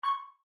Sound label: Elevator Beep, Office, Interior; Elevators (Sound Effects:Machines); SoundEffects-BlastWave